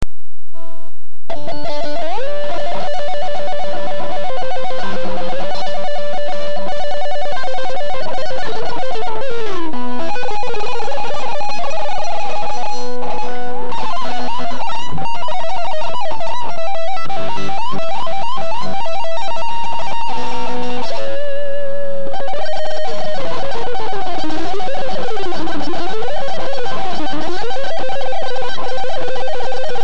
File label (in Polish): taping piorkiem